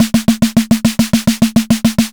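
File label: snare, saturated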